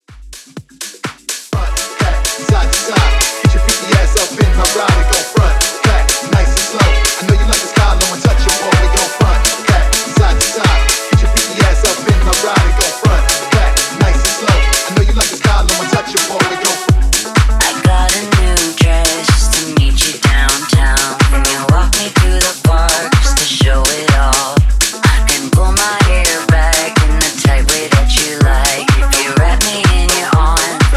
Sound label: House Dance Hype, 125 BPM